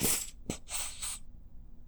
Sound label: Plop - Wheeze, bottle plops